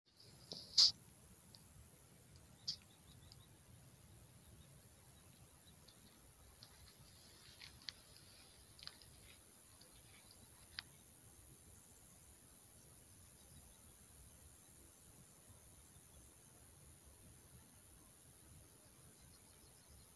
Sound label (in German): röesels